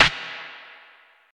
dirty snare d sharp key, Loudest frequency: 2374Hz; snare drum samples